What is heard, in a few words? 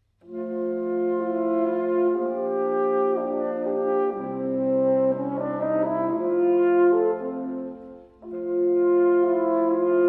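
wind quartet; rossini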